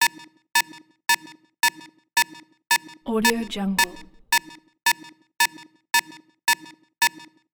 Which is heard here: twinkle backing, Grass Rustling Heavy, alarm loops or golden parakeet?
alarm loops